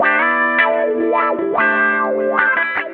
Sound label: GUITAR